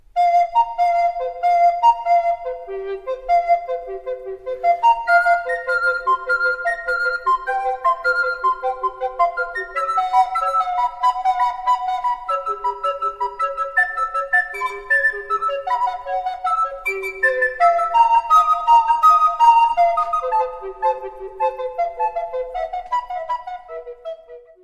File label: Shepherds Gigue